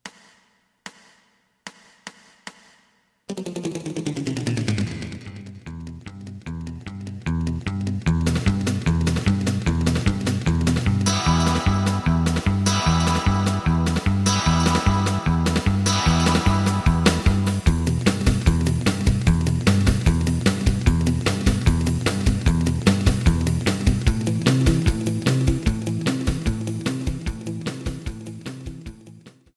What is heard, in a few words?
lead